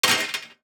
DICE on Metal, Throw and Roll, Standard, Four Dice; Samples; ArticulatedSounds; DICE Sfx Party (Extended)